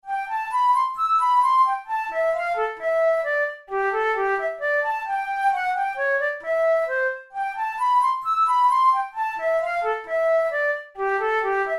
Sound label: study in c major, flute tunes; kohler op33 no1 study no1